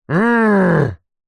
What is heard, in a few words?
human male grumpy growl angry frustrated; zapsplat